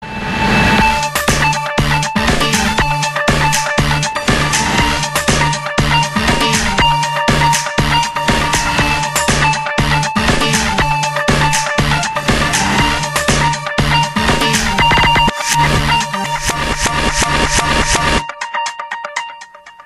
Elektro Hard

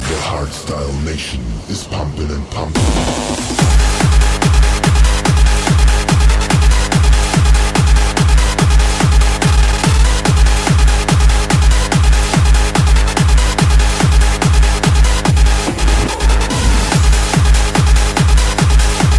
Hardstyle

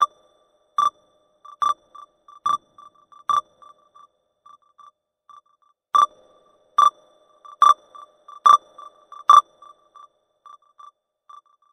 Ekg Delay

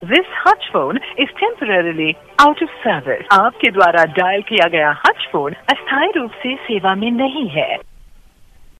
Phone Out Of Service

